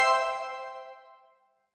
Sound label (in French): badge discret